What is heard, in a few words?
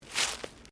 FOOTSTEPS THONGS (FLIPFLOPS) GRASS LEAVES STEP; Footstep Foley; Footsteps (Sound Effects:Human)